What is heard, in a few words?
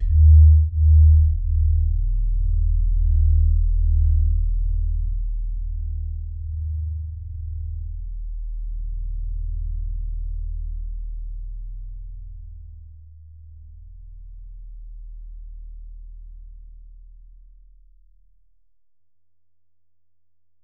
LOW D#1; Q Up Arts; Denny Jaeger Private Collection; LOWX1